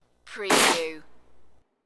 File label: A Loud Crunch